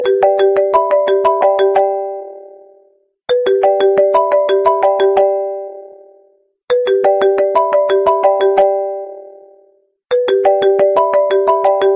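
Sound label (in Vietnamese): iPhone Nguyên Gốc, ringtone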